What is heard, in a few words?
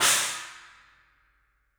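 china, Studio Cymbals; E-MU Producer Series Vol. 5 – 3-D Audio Collection; 3D Percussives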